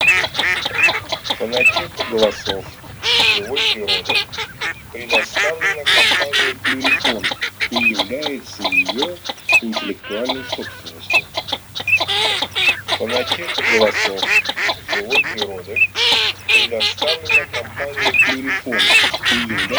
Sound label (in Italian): Mestolone Germano Alzavola; plurifon